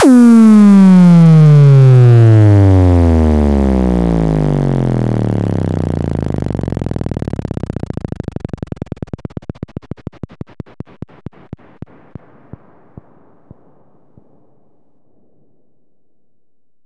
Fx Downer, Bass House; Fx Downer Debug

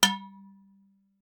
Impact Flask

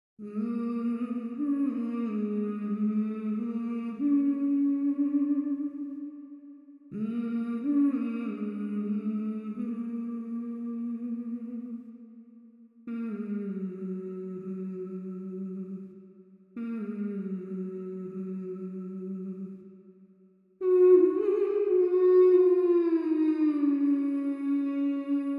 Alice Humming Loop; Bendy Lone Wolf

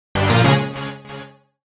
Golden Diva Appears

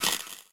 Spark; MiSide

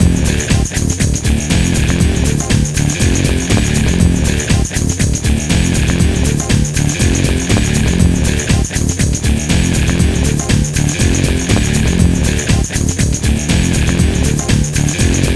Ditty; NBA ShootOut 2001

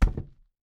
Coconut Impact

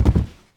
Ragdoll

